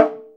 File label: Conga Hi Mute; GMPercussion; 3DO; Toolkit 1.5; GMPercussion44k